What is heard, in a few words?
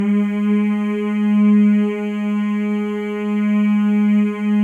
MALE MMS, Symphony of Voices; Spectrasonics